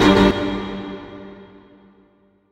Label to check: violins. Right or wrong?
Right